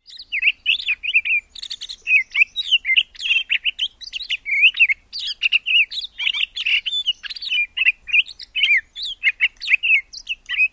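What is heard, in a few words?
continuous song, Townsend's Solitaire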